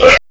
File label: burp; garrysmod